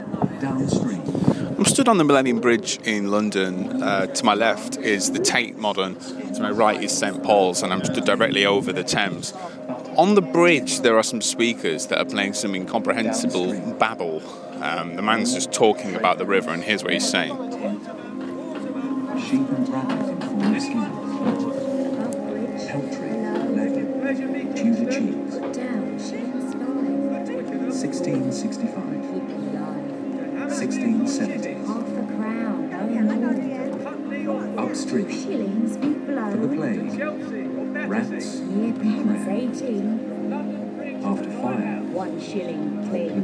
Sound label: weird noises over the thames